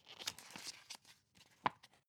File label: magazine open